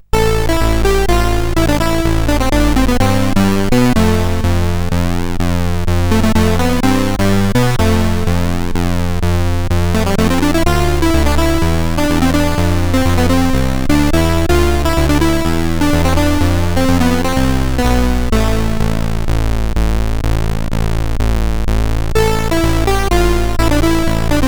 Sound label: Rock, sid CSG8580R5